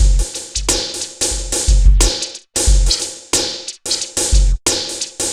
Synth Loop, BPM 90

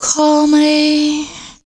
FEMALE, Dance Vocals

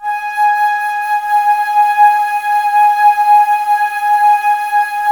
Flute, Wood Winds; Miroslav Vitous - Woodwind Brass Ensemble

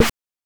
BANK1 SD, NES DPCM; MIDINES

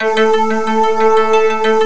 AMBI PAD

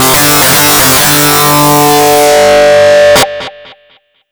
dist loop; DISTLOOP KIT; AKAI

